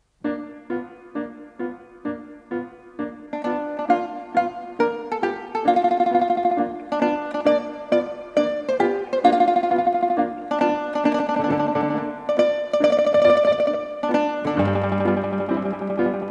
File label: march